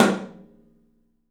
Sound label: TOM, 3D Drums; 3D Sound